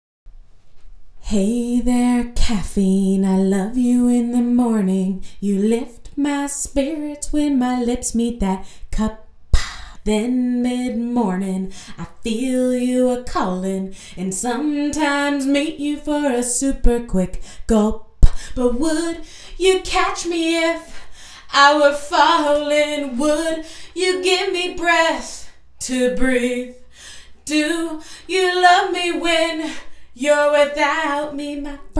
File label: Vocal Stem